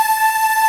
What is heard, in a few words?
Ending loop